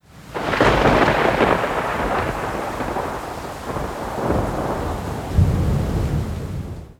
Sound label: Rain with Thunder